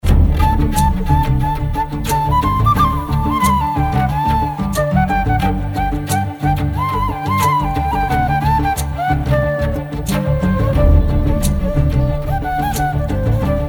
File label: Flute Bgm; Sita Ramam